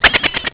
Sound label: laugh